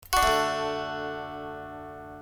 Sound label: D maj upstroke, guitar